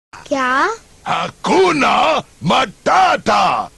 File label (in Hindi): hindi; the lion king